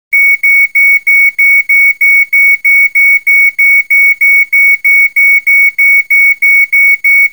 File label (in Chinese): 警报音; 告警; 广播室铃声系统